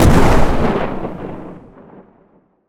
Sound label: Explosion Med Long Tail; jasem just another shooter with electronic music windows 2017